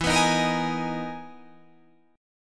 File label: E-Major Sharp 11th E